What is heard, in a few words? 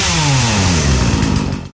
chainsaw saw to idle; tfa starwars melee weapons pack